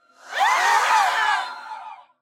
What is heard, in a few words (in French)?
Banshee Cri; Phasmophobia